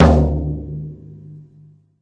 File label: pearl kit low tom, real live kit; REAL LIVE KITS